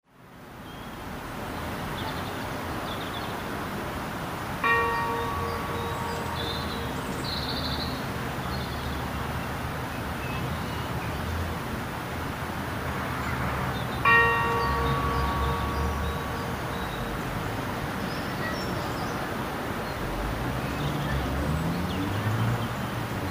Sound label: chalice well bell